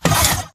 Mvmt Servo Light Short; AI Stalker; Titanfall 2

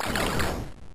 bullet generic titan shield scatter; Titanfall 2

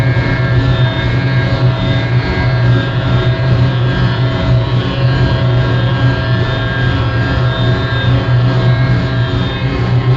Robot Arm Track Slow Close; Boomtown; Titanfall 2

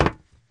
Grunt Mvmt FS Walk Solid Metal; Titanfall 2

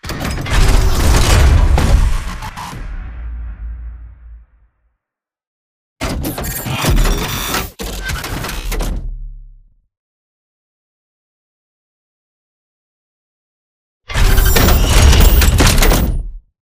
taunt titan scene; Titanfall 2

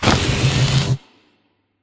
Small Concrete Wall Start; Boomtown; Titanfall 2

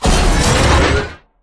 vertical fan switch activate; beacon; Titanfall 2

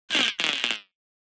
bullet energy titan shield spang cluster; Titanfall 2

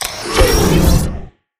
Cloak Activate; Titanfall 2

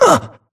grunt hits; Titanfall 2

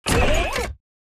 body flinch arm chest; Titanfall 2

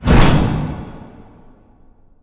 Large Concrete Wall Stop; Titanfall 2; Boomtown

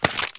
ammo pickup; Titanfall 2

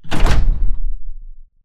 footstep generic; Titanfall 2

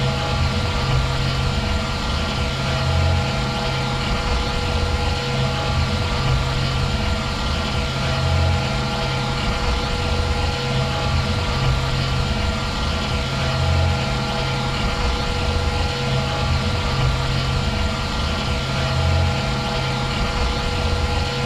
crane move loop; Titanfall 2

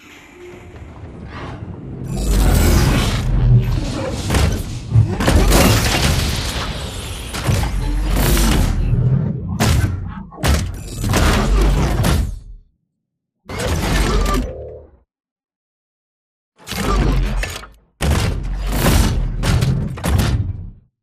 control room pipe; Beacon; BT; Titanfall 2

